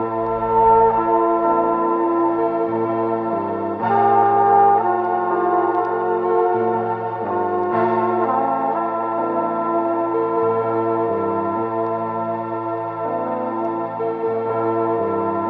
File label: horns, Sad